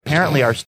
snorting

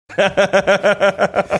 chuckling

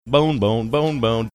singing bone bone bone bone

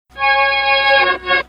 backmasked success trumpet

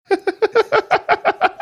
cute laugh

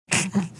sneeze

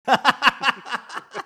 laughing

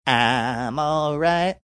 singing

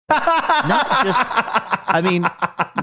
having good laugh

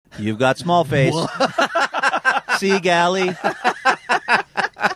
singing youve got small face

